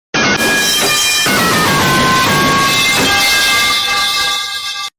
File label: toccato fugue